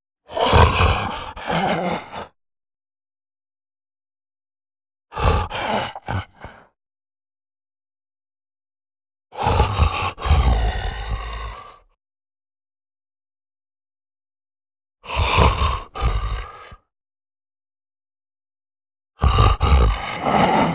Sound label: ZOMBIE MALE HUGE CALM WANDERING BREATHING GRUNT GROWL; etiennebelliere